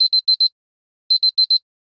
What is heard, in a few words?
Alarm Beep; Nitro HD P930; alarms; LG